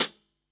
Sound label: Prominent Closed High Hat Sample C Key; hat samples